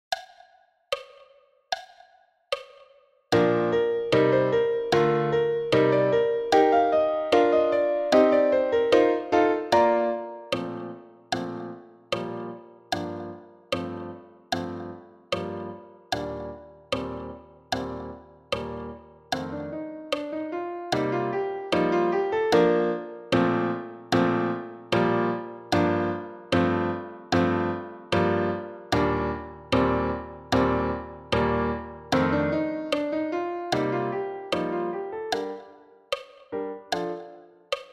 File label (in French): piano a 75 bpm; Multiples